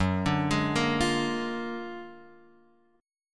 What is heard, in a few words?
F sharp Augmented Major 9th F sharp Guitar Open F; arpeggiated; JGuitar